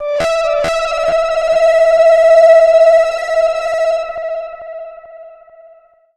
FX DIST; FX DISTO

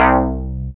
BAS, Eurotech; Sounds Good

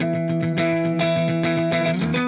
Clean Funk Guitar

